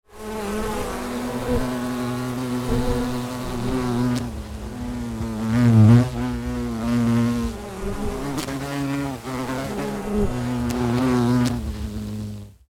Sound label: Bees and wasps buzzing insects; animal